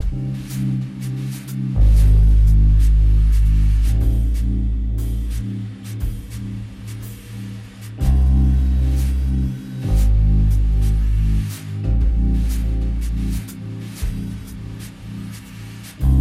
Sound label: Hookah Lounge Loop